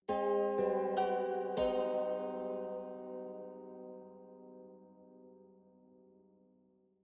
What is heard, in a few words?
haunted strings sequence soft